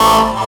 SYN, DANCE SYNTH

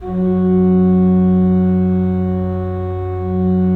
3D Pipe Mix Celeste, 3D Organs

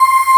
BREATH VOX; AKAI S6000 CD-ROM - Volume 1; SAMPLE WAVE MIXING 1; URBAN SYN

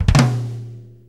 6-8 Kit Flam; 6-8 Loops; Flams; Drum Tools

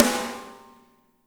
MED SNR, SLAM KIT; Spectrasonics - Burning Grooves CD2

